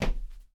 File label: Dance Step; MiSide